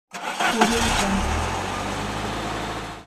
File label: start car engine; lama-sound